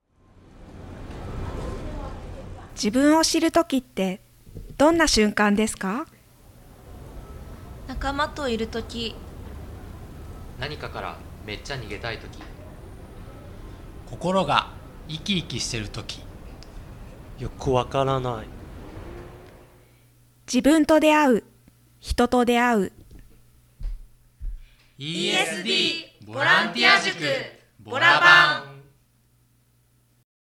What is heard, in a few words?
punch